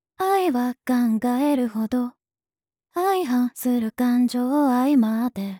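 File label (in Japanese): Synthesizer V, 「Breathy」を上げたバージョン, 歌声; ボーカルスタイル; Synthesizer V Studio 2 Pro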